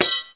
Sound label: drop metal; Heretic II